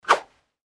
fencing sabre

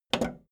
video tape player plastic rewind click fast

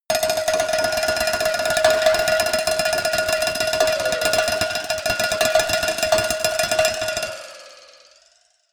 cowbell tremolo move

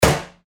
metalbox closing

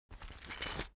key ring mid size pocket put

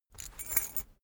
key ring small trousers pocket put

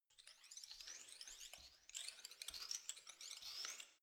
organism settle short

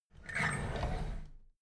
drawer big squeak open regular

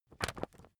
magazine skim

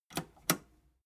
metal button robust click slow

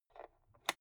rotary control single turn creaky

